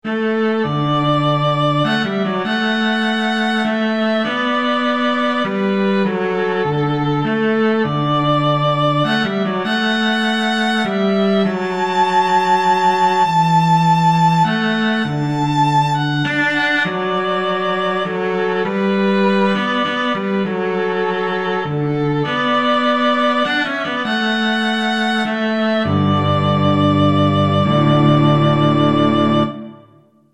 amazing grace, violin-cello duet

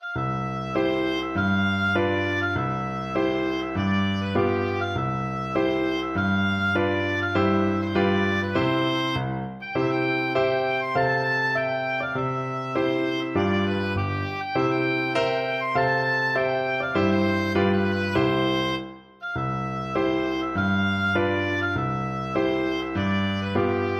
keelrow, oboe

